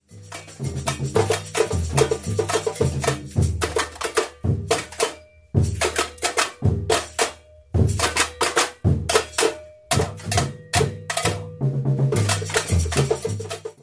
Samba Reggae Break; rhythms

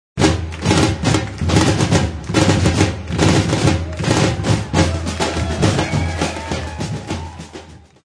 Reggae Break

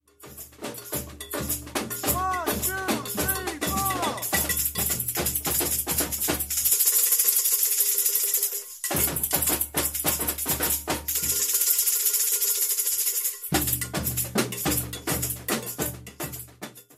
Baiao break, rhythms, bateria

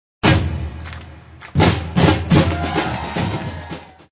Reggae Break; Break 5

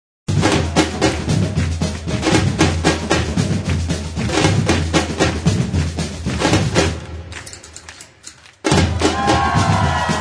Reggae

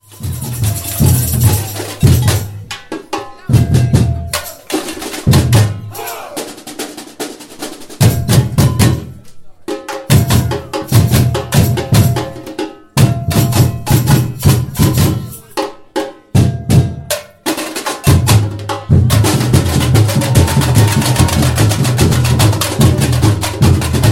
Samba break; rhythms

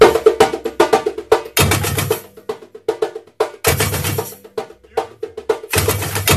Samba Reggae End break; rhythms

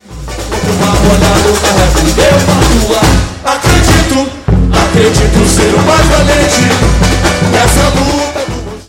Two stop, rhythms, bateria

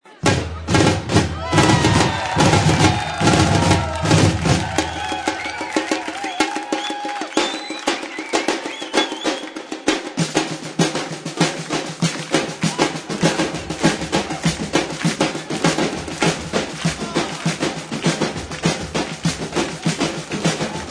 Reggae Intro

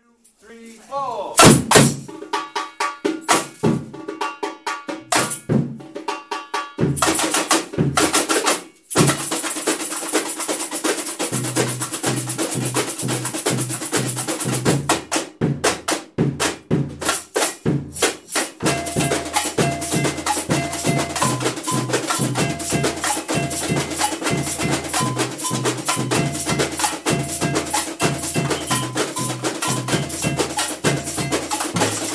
Samba break; rhythms

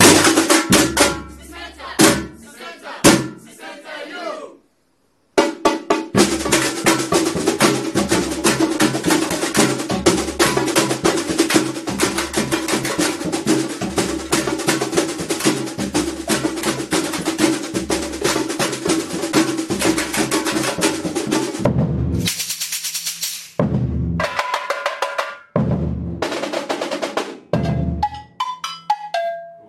Samba break

